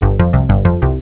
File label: Bass, Cisco Unified IP Phone 7945G